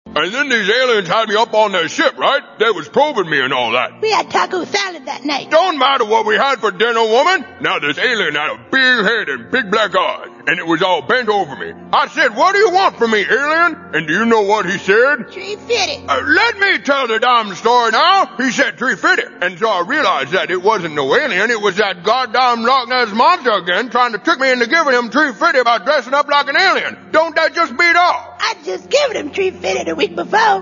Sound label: 303 alien